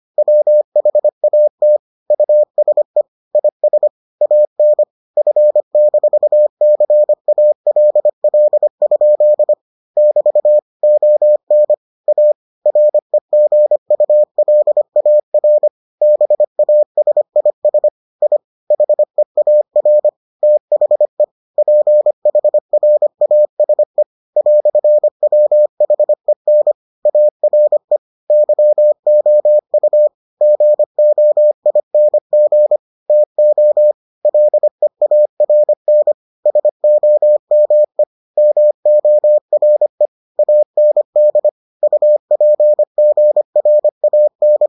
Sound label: morse